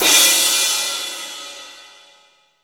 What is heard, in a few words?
CRASH, CYMBALS; CYMBALS 1